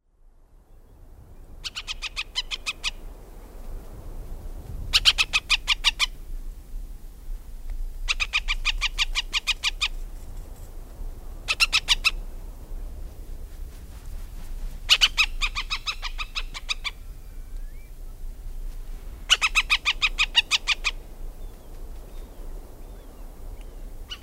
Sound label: Hen Harrier